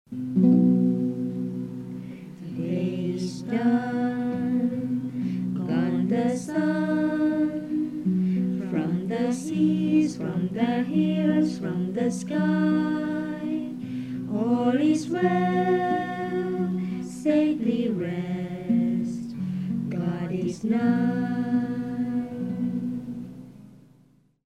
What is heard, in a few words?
Taps; centenary campfire; 是次營火會是一個傳統童軍營火會，以童軍規律為依據。